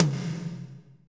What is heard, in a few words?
tom, Power Ballad; Northstar; Drumscapes Roland